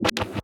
Reverser; Effects; Electronisounds

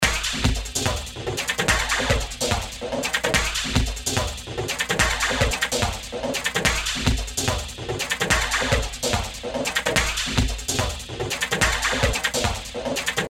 Chatter, drum loops; Industrial

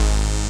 SYN G, BUZZ SYNER

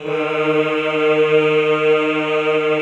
Eeh Ehh; Sound & Vision - Gigapack I CD 2 (Roland)

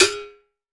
Berimbau Mute; Roland BK-5; Percussion

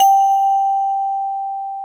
TOYBOX G4, Wavestation; Greytsounds VOL-1

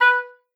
B4, OBOE; Xsample VOL-6

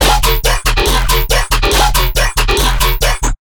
bass loop E 140; Virtual Riot

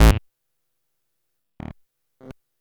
Bass, Futurist Drum & Bass

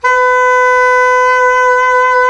SAX SOP MF, Soprano Long

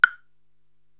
Woodblock; Korg TR-Rack

